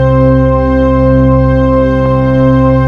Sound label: Individual Notes Chord; color vision